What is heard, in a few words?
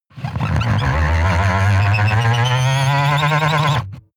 servo low rise squeak on off